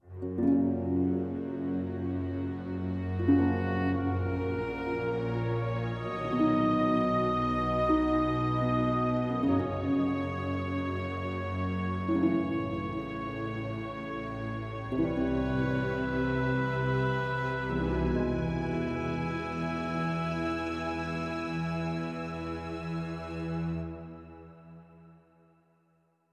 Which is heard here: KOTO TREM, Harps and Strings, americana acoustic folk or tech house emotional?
Harps and Strings